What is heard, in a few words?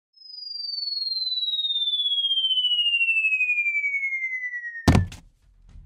Falling Sound Effects